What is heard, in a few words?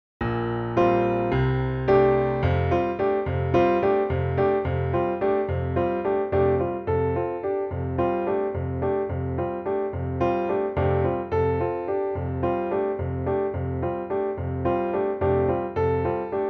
Chicken On A Fencepost Accompaniment